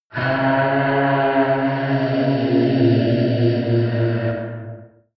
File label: demon ghost moaning; kreaturen